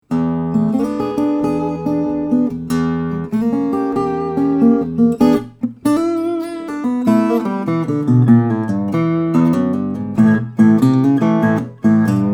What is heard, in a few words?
dunwell minstrel double top, Walnut/Adirondack (Red) inside, Engelmann face